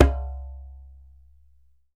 DJEMBE, African Drum

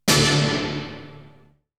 Hit; Hits; ZERO G - Datafile DISC 1

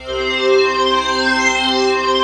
ST PAD1 C5, SYNTHS, House Side Of 2 Step; USB Soundscan vol.51; AKAI

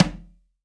CONGA, STEREO CONGA

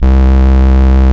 VOX C1, Vintage Keyboards; Big Fish Audio; First Call Vintage Keyboards

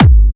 Trance Kicks, Bassdrums

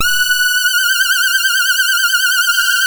Synth and Organ

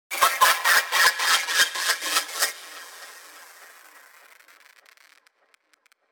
laugh cursed; pekora; M8; random and out of place

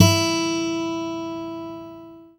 GUI AC.; Sound & Vision; Gigapack I CD 2; GUI ACOUSTIC 1

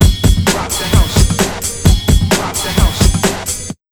HARD 130, Big Beat Drumloops

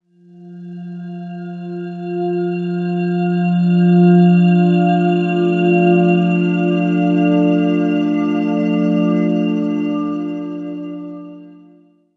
DEEP SPACE BELL WAVE; SLOW SYNTHESIZER WAVES

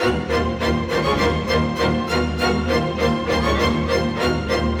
Strings; Sonic Foundry (Sony Creative Software); Orchestral VOL-2; Modern 06

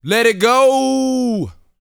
VOCAL F; ILIO

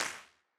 Wider Clap

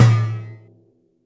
Tung Drum, Yamaha DTXpress; Effect 1

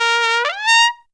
TRUMPET FX; Zero-G; Phantom Horns

